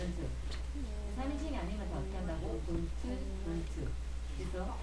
laugh

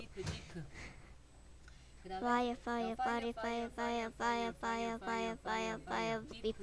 fire

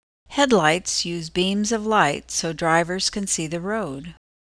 beam

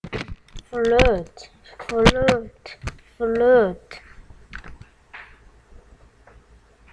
flute

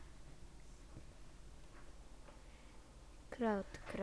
crowd

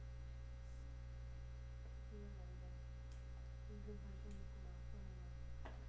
beat

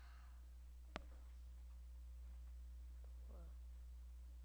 door

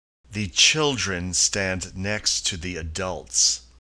children

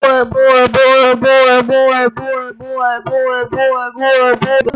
boar

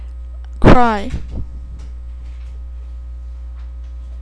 cry

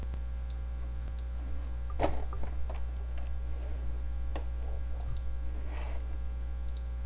windy

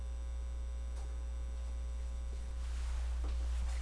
shut

